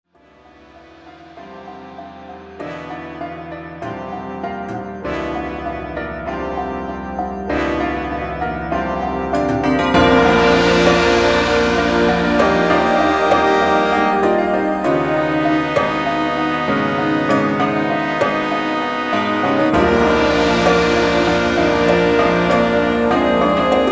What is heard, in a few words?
church clock music; Acousmonuments